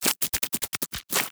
spider emote laugh; Elise

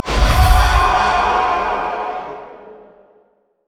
passive dead souls; LoL; thresh

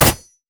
crit attack; LoL; akali

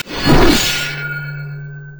quickdraw; LoL; yasuo base

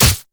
basic attacks; Zyra

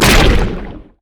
stretch strike; LoL; zac base

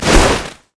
snowday basicattack; LoL; ziggs snowday

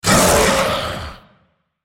Dragon spell cast effort; Shyvana

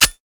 missile barrage; LoL; corki

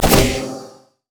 call of the void; LoL; alzahar

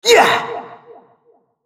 spell cast effort; RumbleSkin03

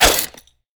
toss; LoL; jinx base

